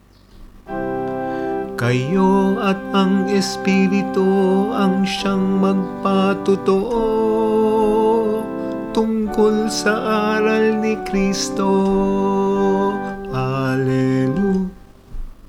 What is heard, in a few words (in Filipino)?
Aleluya Bersikolo; alleluia verse